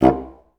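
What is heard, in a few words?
staccato C1, Orchestra; Best Service; Peter Siedlaczek's Advanced Orchestra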